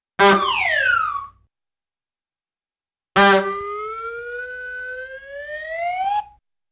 Bulb Horn Honk & Slow Descending Ascending Whistle, Cartoon; Hollywood Edge; Cartoon Trax